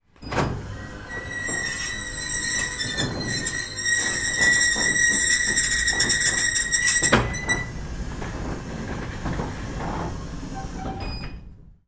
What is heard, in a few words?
household garage door electric roller down squeaky